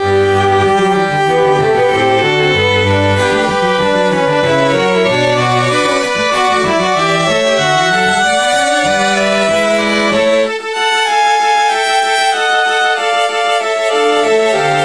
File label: classical